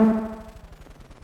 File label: Flutter Echo; Lost Tapes From Mars